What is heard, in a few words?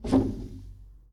Foosball Center Spin